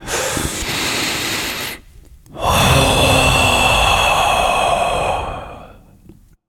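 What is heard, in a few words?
non verbal breath, voice; cernunnos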